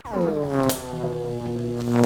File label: TESLA, Science Fiction